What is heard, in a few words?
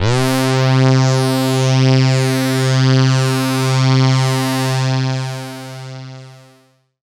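lead C4, ARP 2600; Polyend; Simulacrum Sounds; 1 Shots; Synths; Keys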